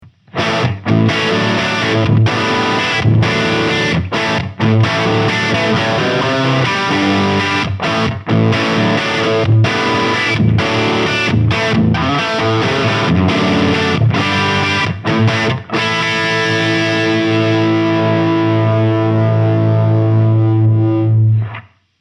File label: Soldano SLO 30 Crunch